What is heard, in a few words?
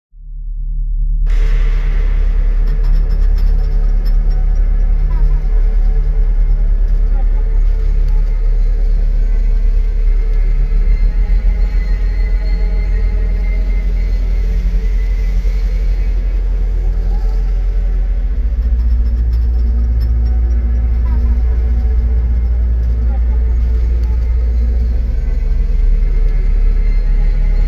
music ambient alien worlds; gemini wars; windows gamerip 2012